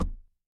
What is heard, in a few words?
impact wood knock